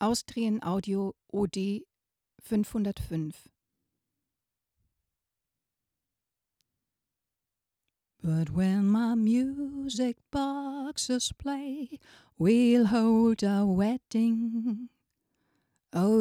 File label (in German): aa od505 gesang